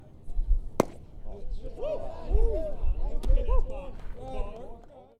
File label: Hoo Hoo Hoo